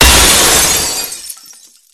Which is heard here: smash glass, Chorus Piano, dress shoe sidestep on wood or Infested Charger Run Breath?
smash glass